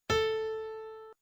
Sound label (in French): Piano La3